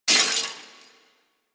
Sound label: Melee Heavy Air Slam Impact Metal; Weapons; Whooshes; Warframe